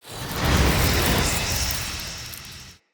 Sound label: Casting Device Charge Heavy; Fenn